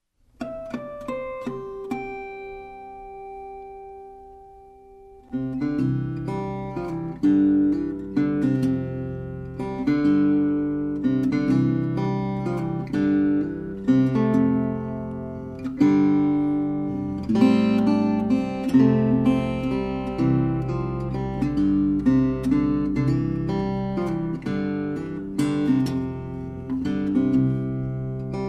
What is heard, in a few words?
The Bells